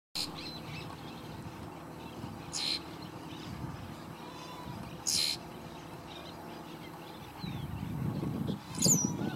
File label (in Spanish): tordo pico corto; Ceibas